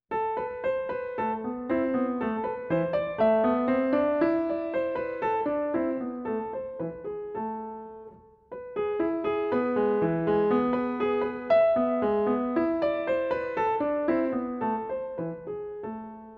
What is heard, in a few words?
Canon; Cornelius Gurlitt; Celebrate Theory recordings